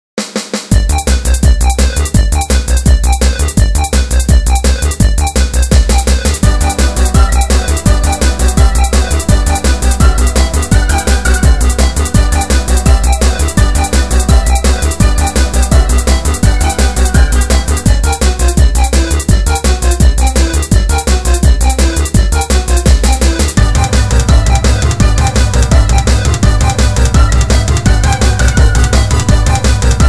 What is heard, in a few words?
Techno Cut